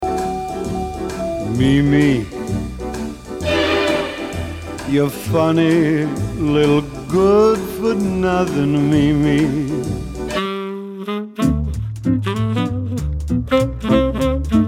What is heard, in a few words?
Jazz and Crooners In Store Radio Background Music